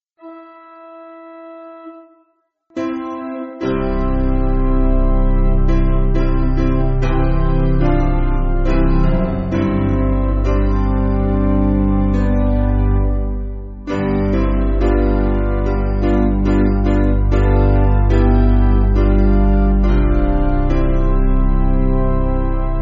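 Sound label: Piano, Music Backing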